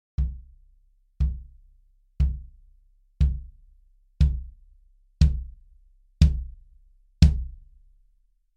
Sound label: Bass Drum 22 - Wooden Beater